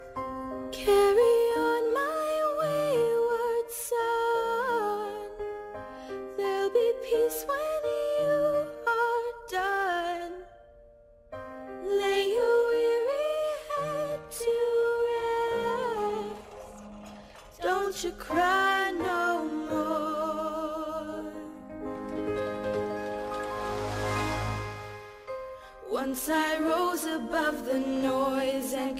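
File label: musical ringtone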